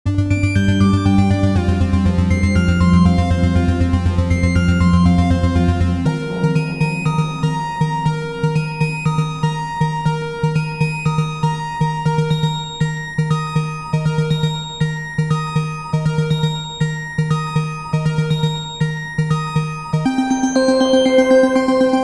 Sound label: Far Away